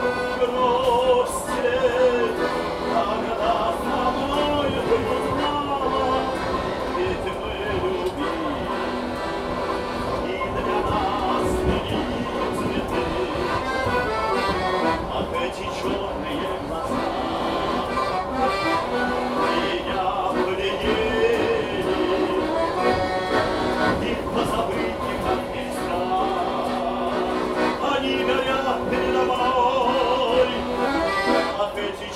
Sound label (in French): accordeoniste train saint petersbourg